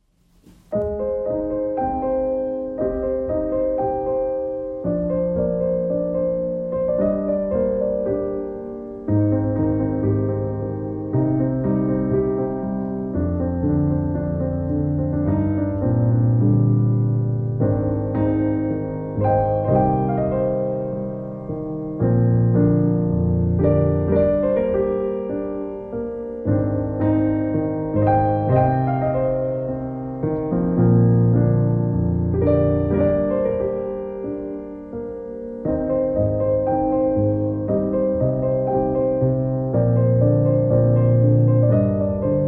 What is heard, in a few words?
relaxing music